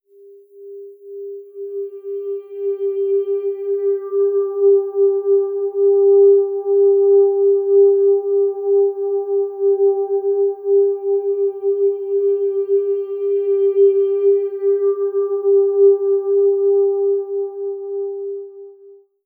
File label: CALM